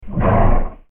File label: horse